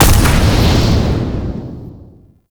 Ground Slam Hit; Warframe; Veilbreaker; Sprag

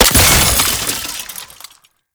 Belt Fed Rifle Last Shot; Warframe; Tenno; Frumentarius

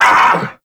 Vocal Set Pain; Warframe; Duviri; Dax

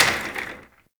Office Chair Impact

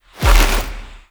Drifter Pull; Warframe; NineteenNinetyNine; Stage12PartyOfALifetime

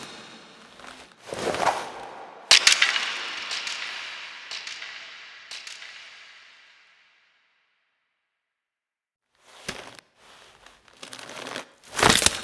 Bone Pit Foley Remove Bone; Warframe; The War Within; Cinematics

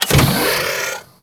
extending bridge start; Warframe; Corpus Gas City; Gameplay Remaster; Ambience

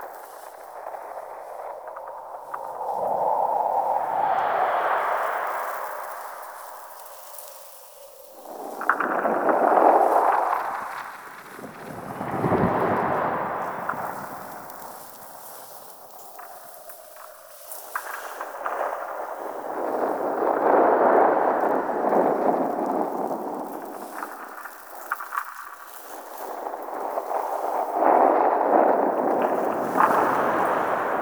Infested Eris Exterior Wind Mid Loop; Warframe; Ambience; Amb Loops Exterior

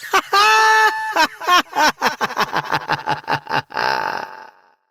Laughter; Evil Twin A; Warframe; Entrati Doppelganger; Battle Barks

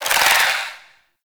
Mummy Scarab Swarm Augment Proc; Warframe

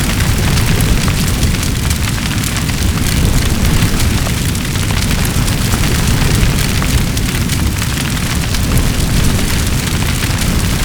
Fire Medium Loop; Warframe; Awakening Remaster; Ambience

